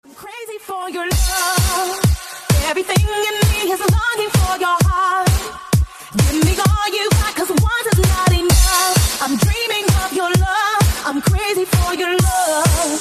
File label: remix ringtone